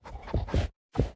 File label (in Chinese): 嗅探兽搜寻声